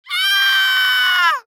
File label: Cartoon Human Male Girly Scream; AdobeCC